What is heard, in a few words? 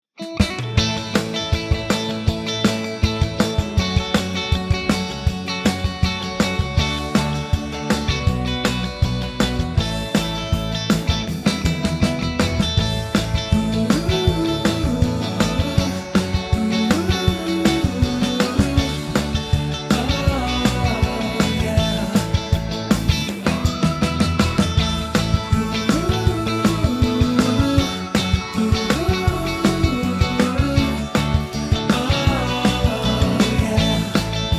60s Surf Rock